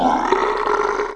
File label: death; Alien Arena; xenoid